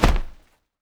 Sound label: bag damage; Counter Strike Model Pack; CS:GO; survival